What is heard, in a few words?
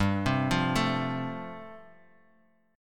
G 7th Suspended 2nd Suspended 4th G